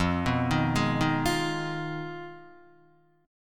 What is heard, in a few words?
F Minor 7th Flat 5th F down Guitar; Fm7b5 arpeggiated; JGuitar